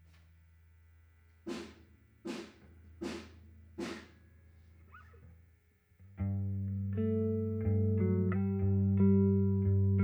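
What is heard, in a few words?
M201 mono Bass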